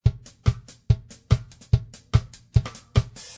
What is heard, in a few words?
Ska Drum; Lounge & Reggae; Loopology; Ska Till Ya Drah